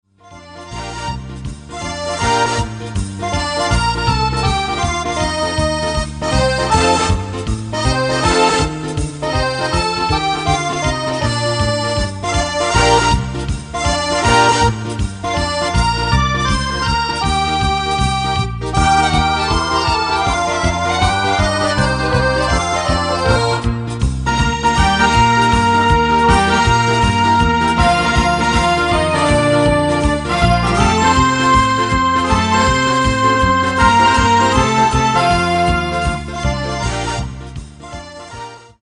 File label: Swing Jive